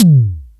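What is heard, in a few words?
Low synth tom, Roland MC-303 Toms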